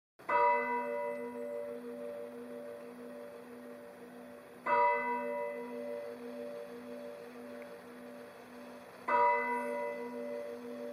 klokken nieuw-beijerland, het geluid van de kerkklok; Ook sloegen de klokken om 8 uur mooi op tijd; Dorpskerken in de Hoeksche Waard

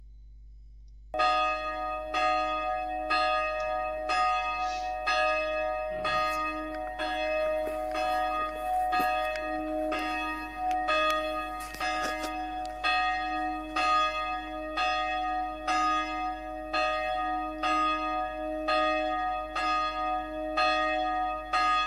klokken westmaas, het geluid van de kerkklok; Dorpskerk Westmaas; Carillon; Dorpskerken in de Hoeksche Waard; Foto van inbruikname Carillon 21 december 1968

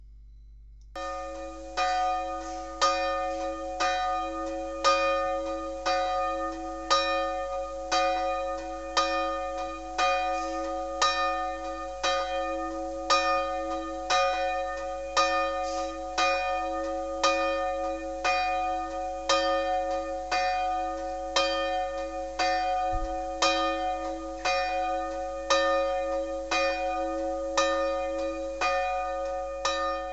cillaarshoek klokken, kerk; Dorpskerken in de Hoeksche Waard

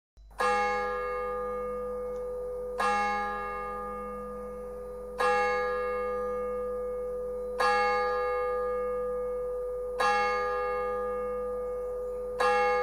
klokken heienoord, het geluid van de kerkklok; Dorpskerken in de Hoeksche Waard

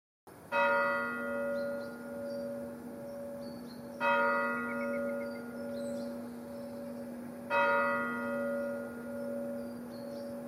klokken Piershil; Dorpskerken in de Hoeksche Waard

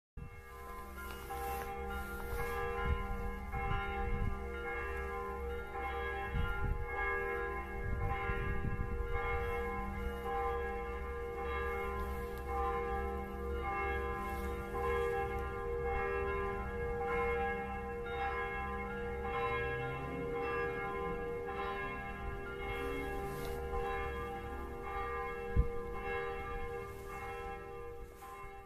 s gravendeel klokken; Dorpskerken in de Hoeksche Waard

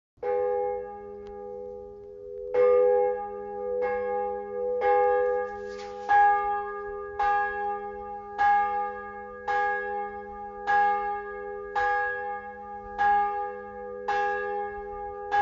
klokken numansdorp, het geluid van de kerkklok; Dorpskerken in de Hoeksche Waard

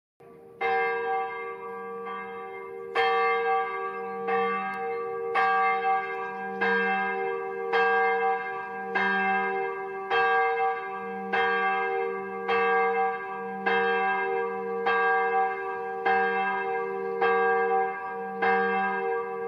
puttershoek klokken, het geluid van de kerkklok; Dorpskerken in de Hoeksche Waard; Maar gelukkig sloeg hij wel om acht uur.

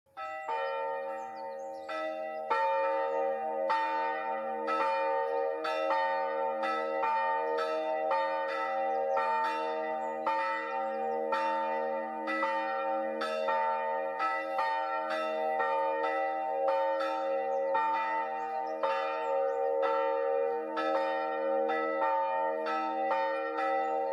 klokken goudswaard, het geluid van de kerkklok; Dorpskerken in de Hoeksche Waard

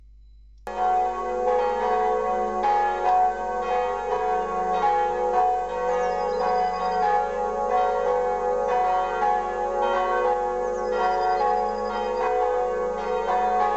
klokken mijnsheerenland; Dorpskerken in de Hoeksche Waard

